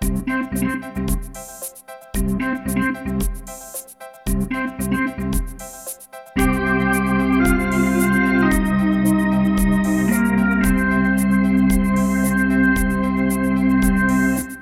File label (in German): Wersi Orgel Jingle